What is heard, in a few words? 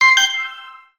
abuzz ringtone; message